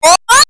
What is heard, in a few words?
Sonic Air Bubble Sound Effect; Sonic Gulping an Air Bubble